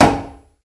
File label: Knuckle Knock On Tub, bathtub hot tub